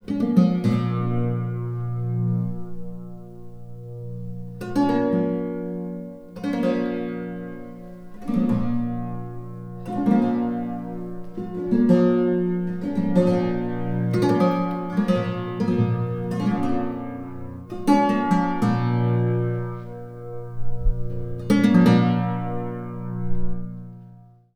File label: guitar swinging